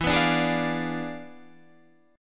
E 7th Flat 9th Guitar Standard, chord; E7b9; strummed

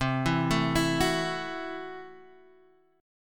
C 6th Flat 5th, C, down, Guitar, Half Step Down

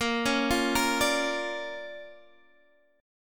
D Minor Major 7th Sharp 5th, Bb, guitar

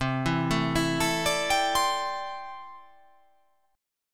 C 6th Add 9th C down

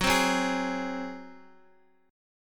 F sharp Suspended 2nd Flat 5th, Guitar; strummed; x,x,6,5,6,4; down; 4F,4A,5C,5G,5C,6E

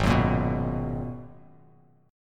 Ab Minor 9th down Bass Standard

